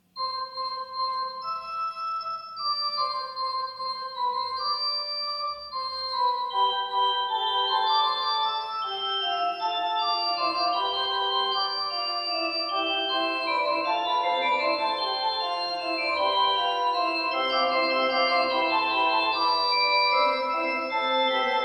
Little Prelude and Fugue in C Major Fugue; Bach; BWV 533

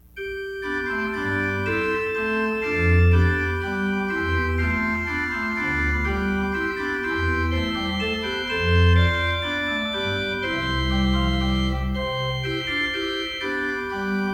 Orgelbuchlein BWV 631; Komm Gott Schopfer Heiliger Geist; Bach

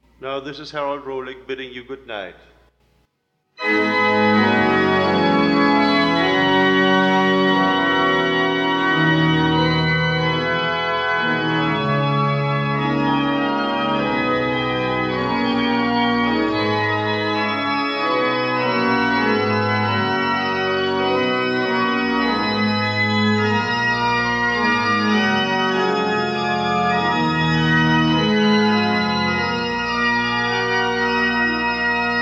Improvisation

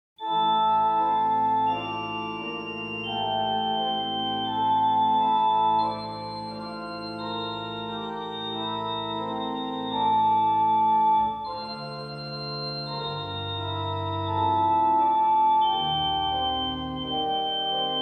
Short Chorale Preludes; 8 Short Chorale Preludes; 2 The Day is Passed and Over

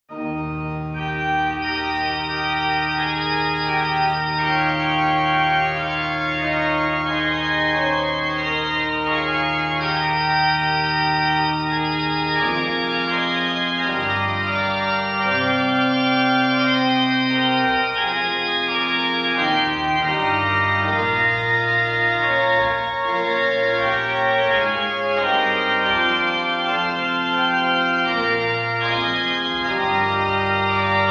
Short Chorale Preludes; 8 Short Chorale Preludes; 3 The Church is One Foundation

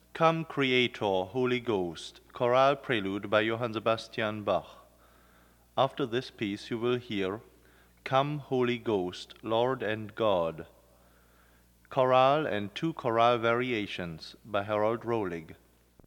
Orgelbuchlein; Bach; BWV 641 Come Creator Holy Ghost

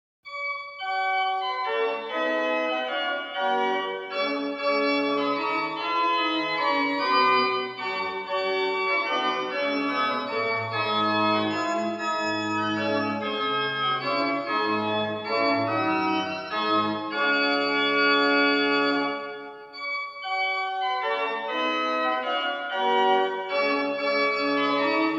Organ Pieces; Frescobaldi; Four Short Organ Pieces 4

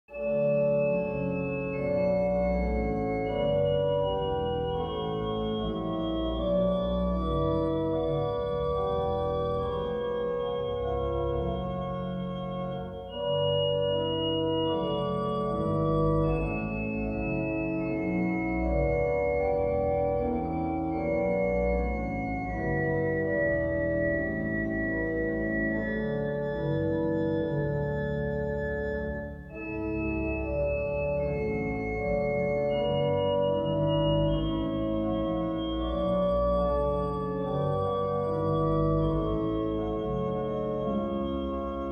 Chorale Preludes; Reger; 30 Short Chorale Preludes; 13 Jesus Christ My Sure Defence

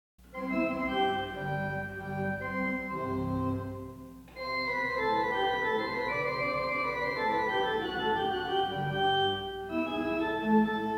Prelude Fugue and Chaconne in C Major Prelude; Buxtehude

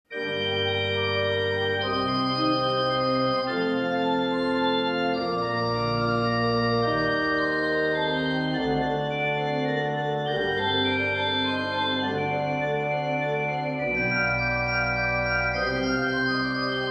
Little Prelude and Fugue in G minor Prelude; Bach; BWV 558

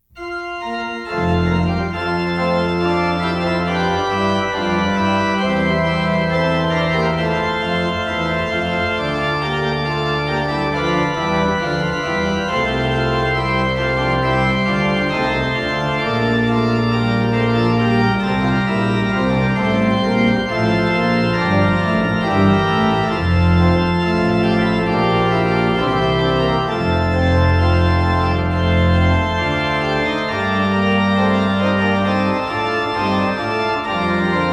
Orgelbuchlein BWV 602; Bach; Lob sei dem allmachtigen Gott

